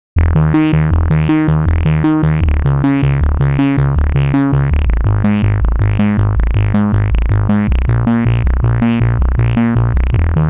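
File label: seq mono 8 steps wet rhythm; the meditation soundset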